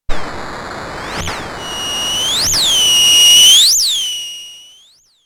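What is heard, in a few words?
FM Oscillator Chirp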